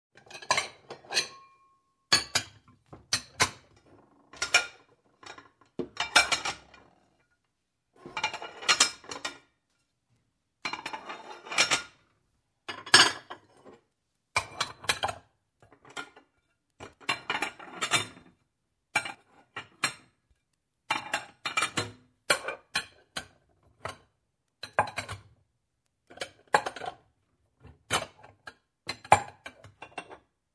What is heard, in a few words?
Putting dishes in shelf